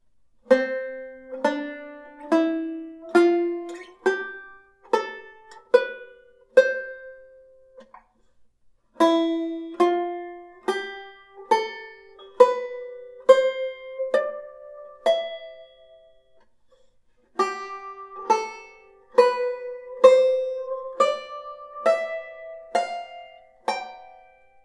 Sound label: Scale Harmony, banjo; Beyond Chord Melody Volume II